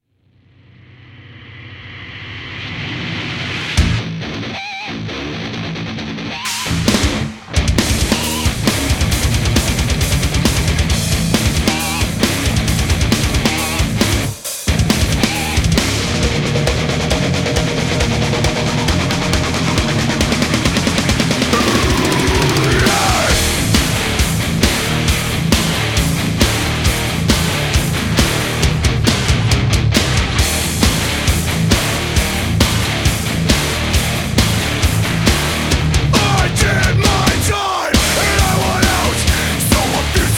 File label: mus opening; Smite